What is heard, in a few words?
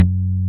Pluc Bass; Best Service Gigapack 2